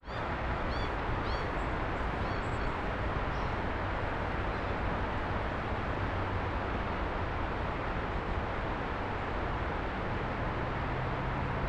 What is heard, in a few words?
path to carpark steps, Falls Tour; Dight's Falls; Dight's Falls Tour 2026